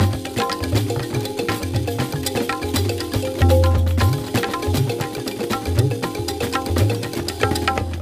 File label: percs; rayman origins 2011 gamerip; music world